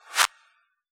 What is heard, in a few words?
Reverse Claps, Yamaha DJX; 155 DJX Kit